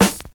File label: clean steel snare drum sound g key; snare drum samples